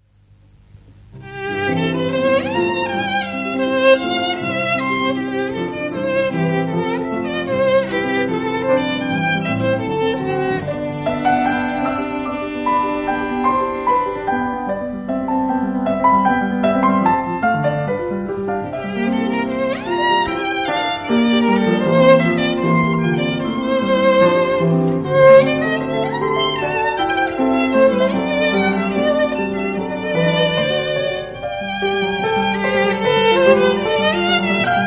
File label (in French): Sonate violon piano